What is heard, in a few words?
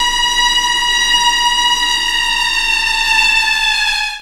alarm; Ueberschall; Techno Trance Essentials